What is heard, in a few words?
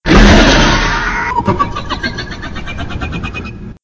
Monster Attack